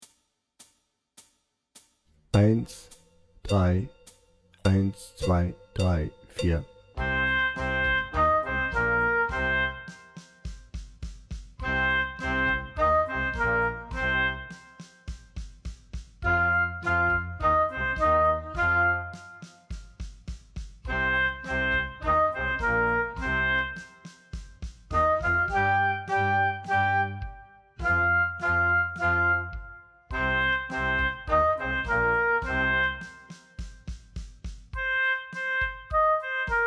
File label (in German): Hard Rock Blues Oboe lauter langsamer